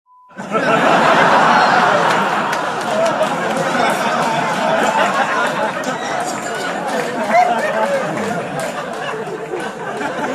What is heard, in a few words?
Laughter Collection